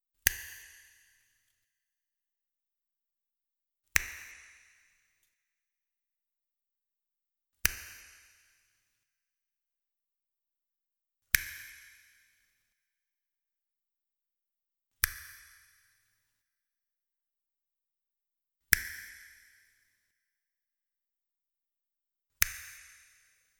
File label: Finger Snap